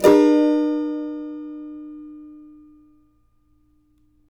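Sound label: A minor, Guitars; Spectrasonics; Hans Zimmer Guitars VOL-2; CAVA CHORDS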